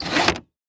Technology Electronic Machine Fax Machine Paper Adjustment Taps Sliding